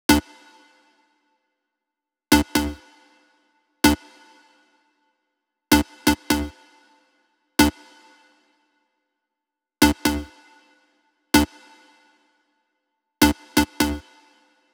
128BPM Lead Root D; Uno; Vengeance Samples; Uno Kit

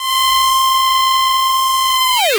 Roland D Line, Roland S100

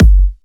Kicks; Vengeance Samples

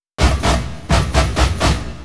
Fill 128 BPM; Vengeance Samples; VEH1 Loops

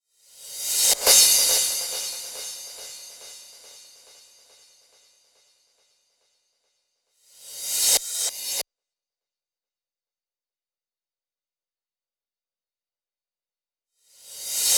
Crash Mix, 140 BPM; Vengeance Samples; Vocal-Hook Kits; Song Kit; Play Girl

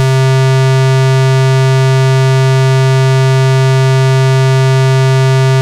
Square C3, Moog Voyager; Moog Voyager Sounds; VES1 Square

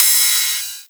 Ride, Cymbals; Vengeance Samples

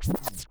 back spin short, vinyl record

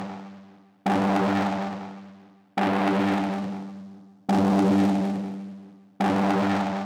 Kit 140BPM Noizy Synth DRY; G minor; Melody & Synth; Vengeance Samples; Space Of Time Kit

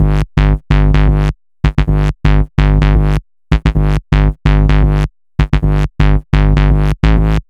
Melo 128BPM; Mini Kits

